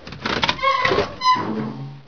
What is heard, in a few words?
lever; hl2; buttons